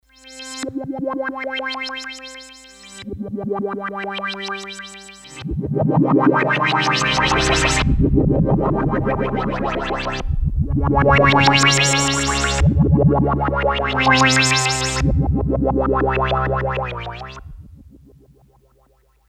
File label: Plasma Driv